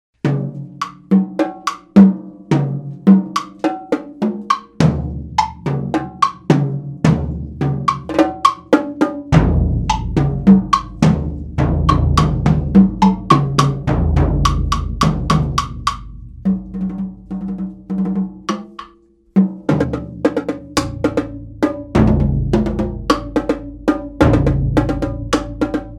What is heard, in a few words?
Tango Sans Melody